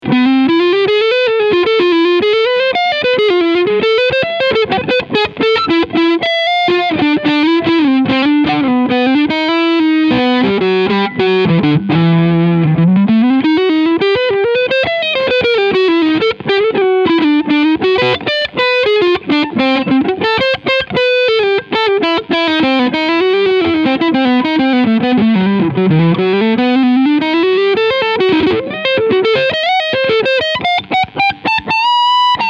PRS SE Hollowbody MT McCarty TSB Overdrive Hals Pickup

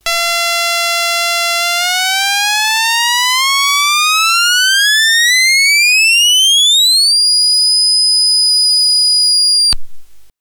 Otamatone Deluxe hohe Range Vorsicht mit Kopfhoerern

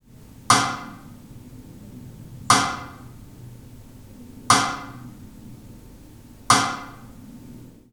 Bad Direktaufnahme Sidestick

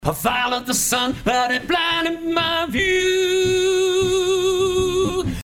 2te Stimme

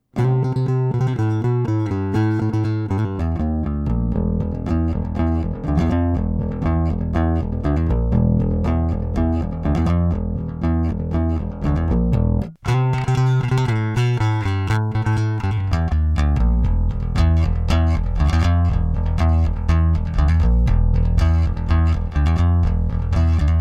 Rickenbacker Passiv dann Aktiv 3 Signale DI Preamp Avalon Via Tuner Out Full Boost

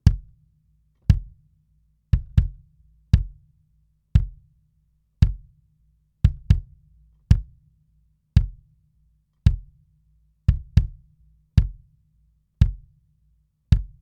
Fredenstein F200 Kick mit Kompressor

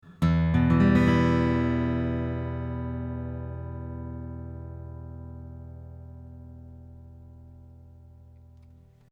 A Git AIR OFF; GA 47

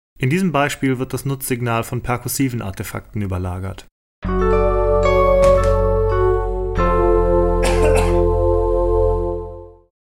Perkussiv Original

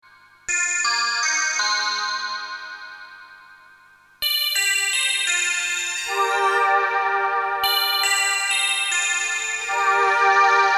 Digitalsound und Chor

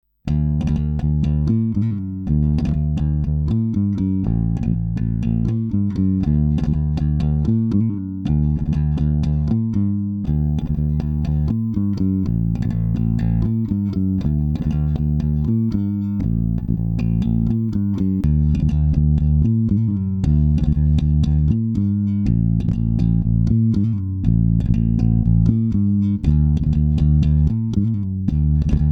Rickenbacker Para Mid Voll Boost dann Voll Comp dann Maximizer; BBE

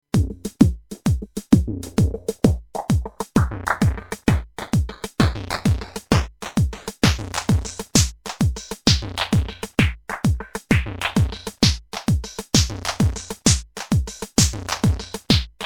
TR8 Delay Lofi ohne Kick Hihat

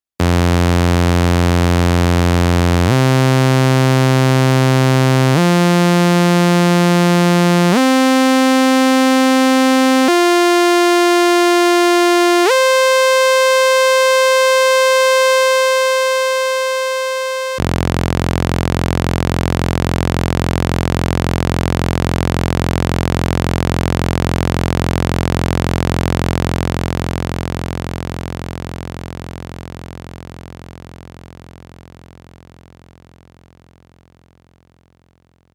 Sägezahn

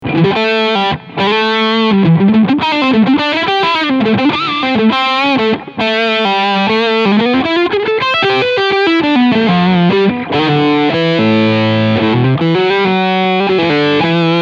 Overdrive Steg PU

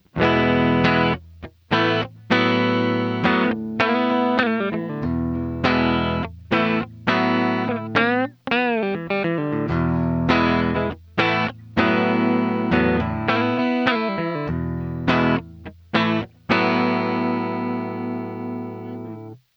Orange Gitarren Effektpedale Sustain Low Depth

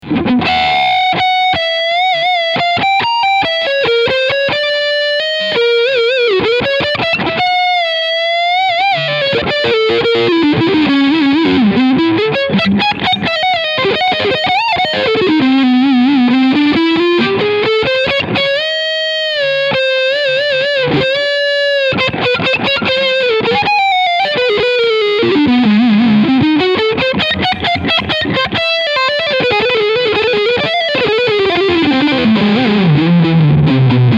Leadsound beide EMGs

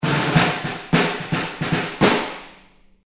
Snare Main und Close Mic

